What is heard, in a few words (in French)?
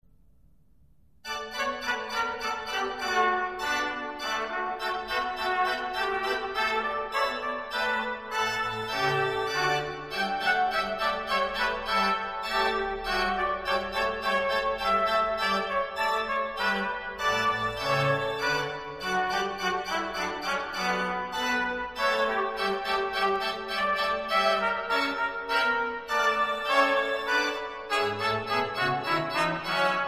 trompette et orgue